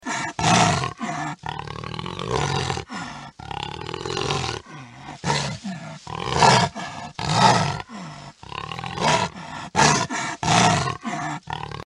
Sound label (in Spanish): RUIDO DEL JAGUAR; el picure y el jaguar